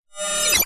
science fiction robotic cyberpunk electronic equipment beep glitch; zapsplat